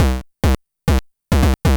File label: LSDJ Wonkin' Loop 136BpM, Nintendo Gameboy Loops